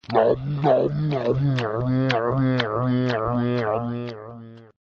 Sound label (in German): mjammmjam